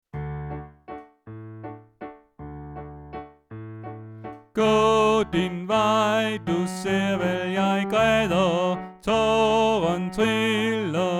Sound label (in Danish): Bas